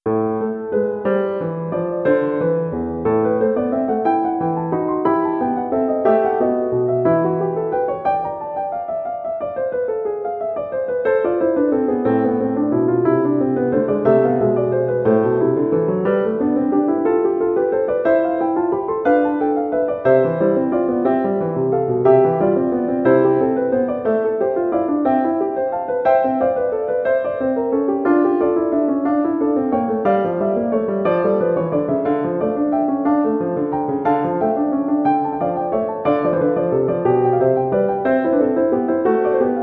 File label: inventions a 3 voix piano; bach johann sebastian